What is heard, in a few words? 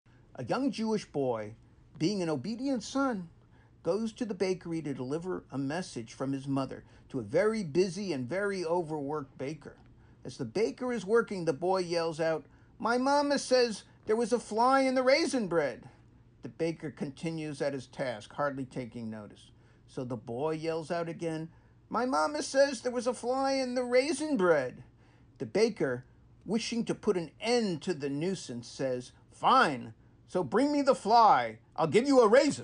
Laugh Track; Jason Alexander; Joke